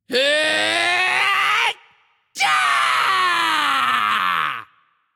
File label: battle cry; smite; loki